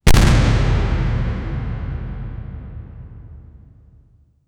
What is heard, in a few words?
viking horn impact